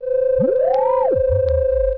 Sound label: error